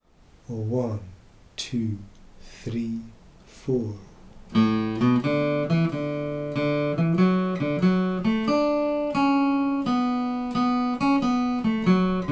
Hut on Staffin Island Session Speed, Slow Scottish Reel in D Major